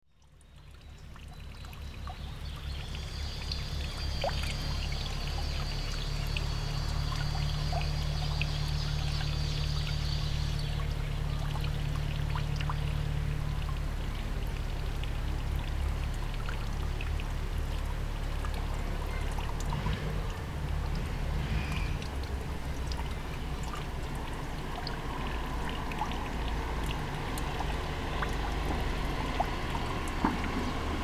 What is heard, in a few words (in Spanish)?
desague; canada de gomez; calle marconi y necochea